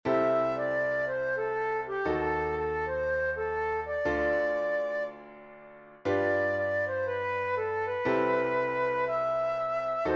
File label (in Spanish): flauta